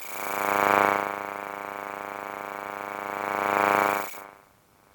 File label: Electromagnetic Field Extractor Fan Box; Glitchedtones